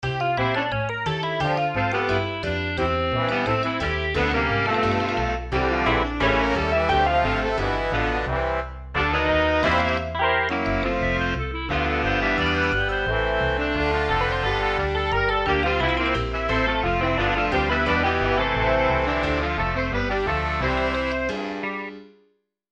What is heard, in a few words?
bebop bigband